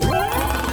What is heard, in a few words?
Sfx Ingame Invicibility Begin